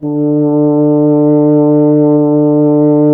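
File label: BRS F.HRN, Solo Instruments, French Horn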